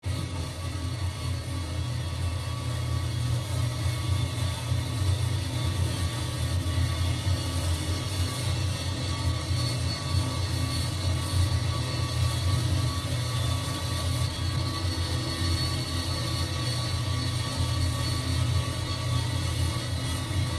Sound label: Ambience Tonal Gas; drones & tones